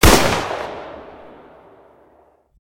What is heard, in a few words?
toz shoot; metrocraft; weapon